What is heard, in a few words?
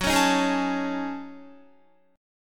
Gb Minor 6th Add 9th Gb down Guitar; Gbm6add9; strummed